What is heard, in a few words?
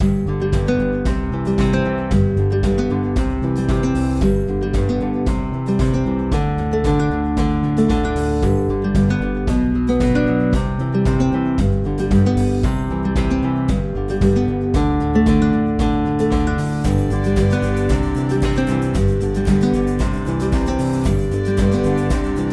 Inspiring Acoustic Indie; happy music vol 9